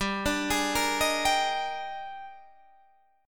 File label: Eb Major 11th G, guitar